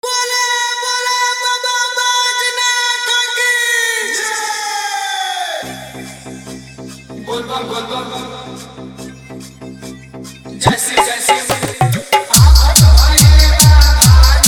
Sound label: Bolabm Full Hard Jhan Jhan Bass